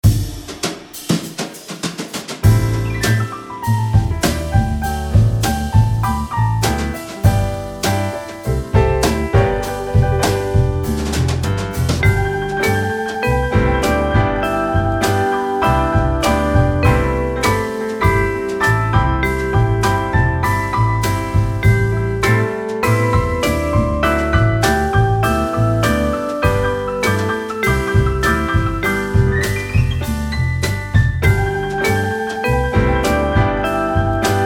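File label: G Pentatonic Extended Scale, harmonica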